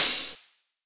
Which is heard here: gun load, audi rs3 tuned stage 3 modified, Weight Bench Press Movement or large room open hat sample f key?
large room open hat sample f key